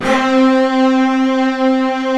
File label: SYNTH ORCH